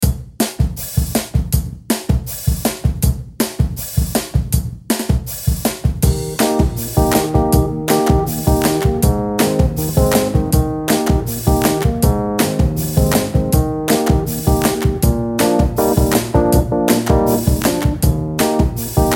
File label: music